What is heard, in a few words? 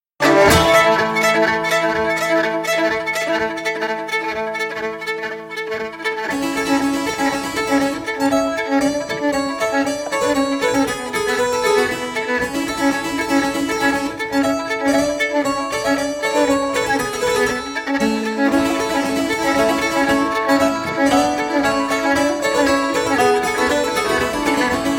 arpeggio